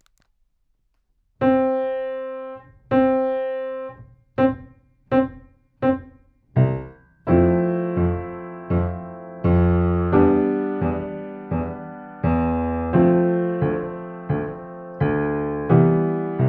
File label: C 4 bars, sax